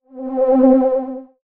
drone warning

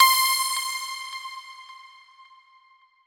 score gem

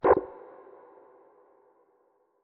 absorb tree

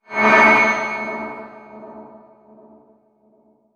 create synthoid

